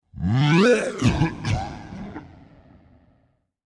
Laugh (Cough); Going Under

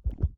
Heart Beat; Lethal Company

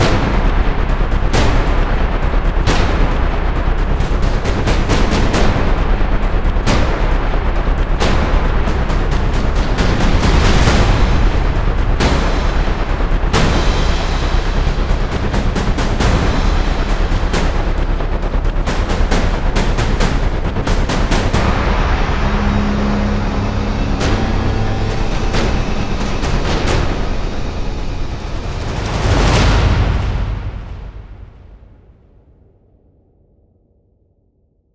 Base Loop low

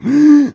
Breathin; Submarine; Neighbours From Hell Console Edition; GC, Xbox

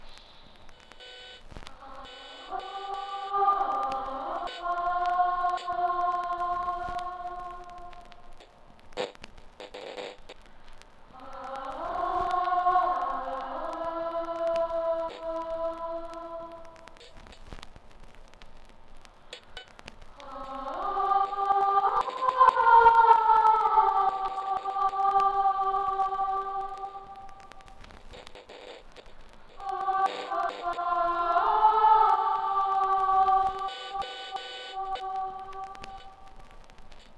Choir; Planet of Lana

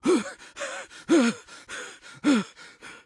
Guard Start Run Stumble Breathless; Subway Surfers City; Android, iOS

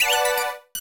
PLAY TRAMPOLIN; Chibi-Robo Park Patrol; Nintendo DS

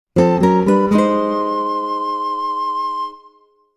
Item Fanfare; Milk Bar Musicians; the legend of zelda a link between worlds original soundtrack

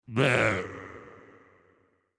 Effort Medium

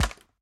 Bounce Electronics

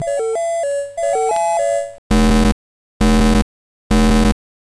End Of Level Tune; Donkey Kong; Amiga

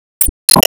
Enemy Slime Rebirth; Disney Star Smash; Android, iOS, Mobile

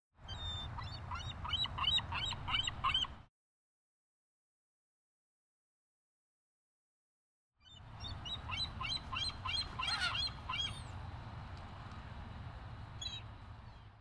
Golden Gate Bridge Seagulls

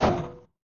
Hit Metal; Lethal Company

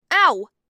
anti ow

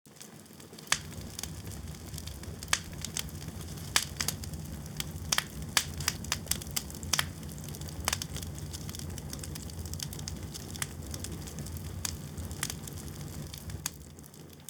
Fire; Smeshariki Cartoon Studio

